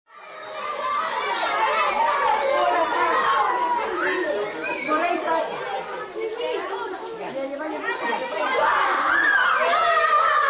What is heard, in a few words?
Ambience Room, Rowdy, Group of 10-15 children playing loudly indoor, human voice, kids; Yelling; boys & girls,stereo; VoiceBox